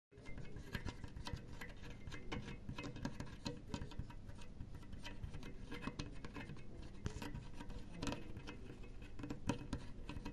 clous

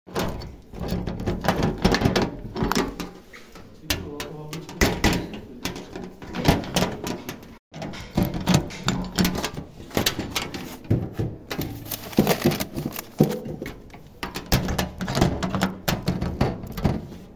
casiers

